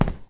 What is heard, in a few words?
Tile long